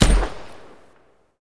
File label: chopper shoot